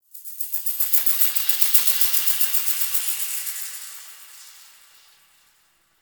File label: Exterior Space Rings Details, Ambience; Warframe; Railjack